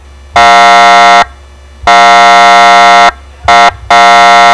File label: Fed Sig Horn